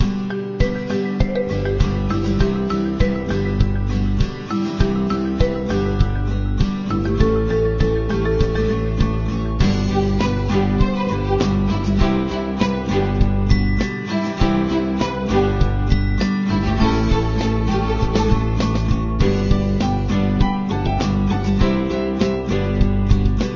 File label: Level Theme